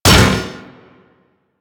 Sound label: Drone Fall Impact C; Warframe; Grineer Drone